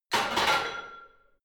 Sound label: barbell heavy plates replace rack hard, fitnessstudio